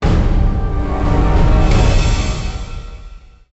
Sting Game Defeat

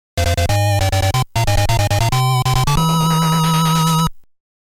Award Announcement Fanfare; Nekketsu Kouha Kunio-kun Ongakushuu

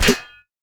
helmet trace hit

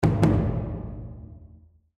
action drum stinger

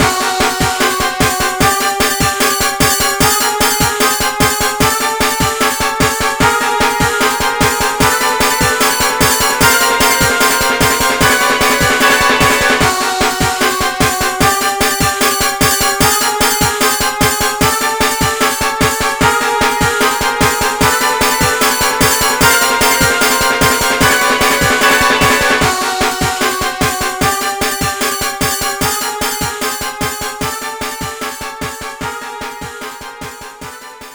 BGM Timeshock; Irodzuki Tingle no Koi no Balloon Trip; NDS

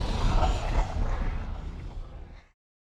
Lava Hit

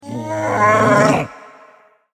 Monster Water Shot

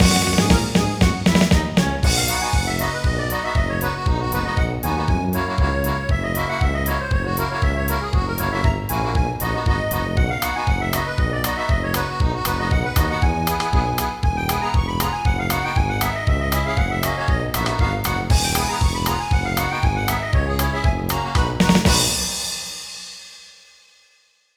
Fish Polka; Rise of the Triad

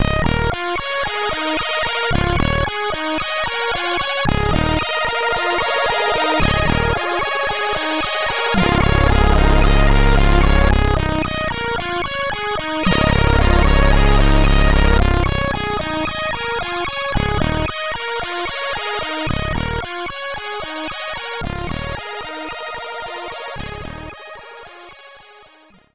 Loading Tune (SID Stereo), commodore 64; Snare

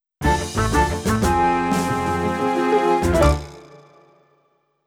Airplane Failure Jingle; Pilotwings Resort

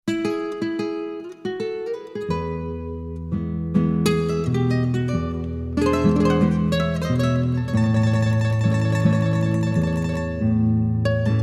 Music Vehicle Radio Events; Jurassic World Evolution

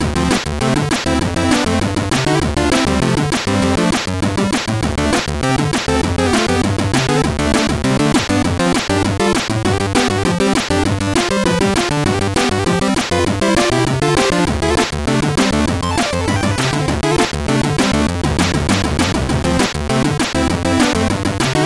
BGM; Micro Machines V3; Gameboy

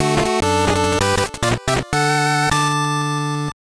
Level Clear; Tail 'Gator; Shippo de Bun; GB

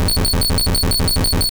Spark; sfx; attack moves; pokemon; gen 2; gsc

